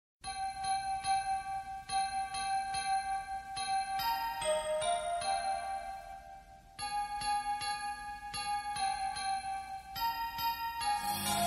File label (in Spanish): navidad